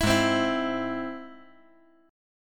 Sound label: Ab Augmented Ab; Ab+ strummed; JGuitar